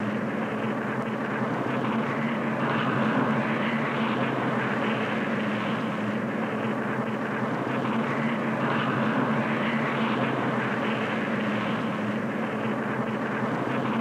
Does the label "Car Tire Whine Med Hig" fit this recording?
Yes